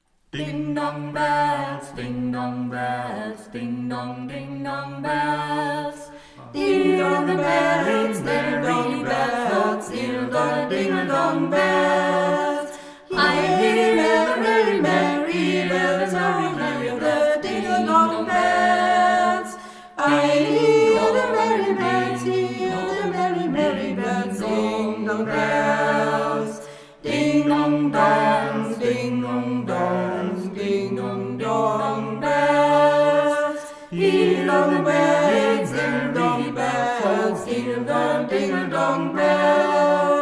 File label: Ding Dong Bells; Noah-Studios